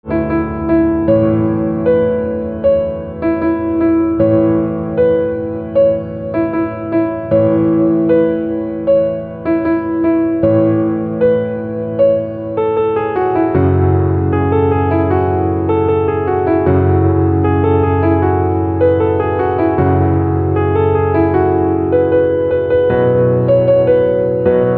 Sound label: Piano